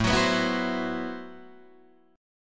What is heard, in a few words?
A Minor 6th Add 9th A Guitar DADGAD